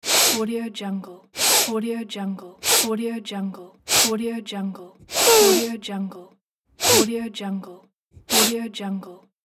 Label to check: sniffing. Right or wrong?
Right